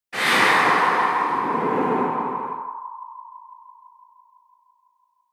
Fantasy Thunder Strike; Scary Soundscape Sound Effects

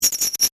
Digital Crackle, Zap; Production Element

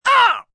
Grunt Pain, Grunt, groan, impact, male, short; Groaning; Voice Box

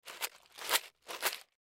Box Shake Candy; Box Shake Candy Mints Package; Shaking; Foley; Objects; Blastwave

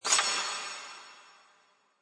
Two Swords Sing, Two swords glance off eachother; Two swordsmen duel; Sound Effects:Combat

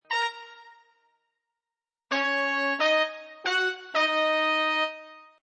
Jazz horns loop in D# minor at 180 bpm.; Jazz Horns Loop Riff 2 D#m 180 bpm; Brass Loops

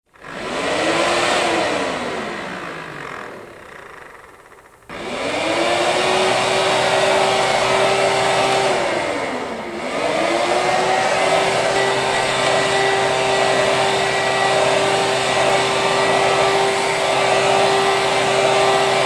Hairdryer on, off and run; Appliances; Household

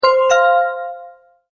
multimedia tone verified

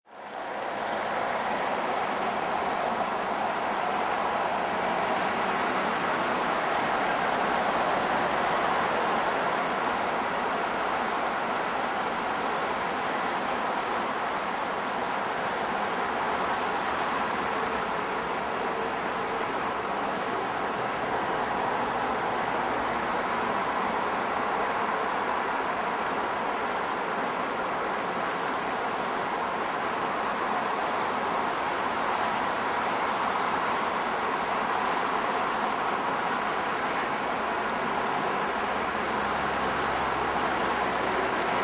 City Ambience Traffic From 3rd Floor; People

